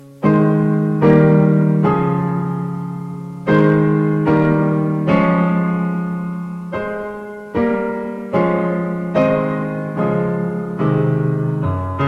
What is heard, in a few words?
Piano